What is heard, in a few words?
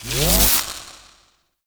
Experiment Rifle Charge Up, Weapons; Warframe; Corpus; Sent Experimental Riffle